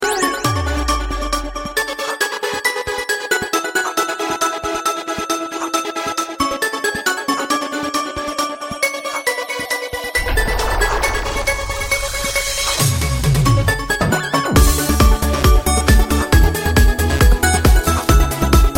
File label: Trance Beat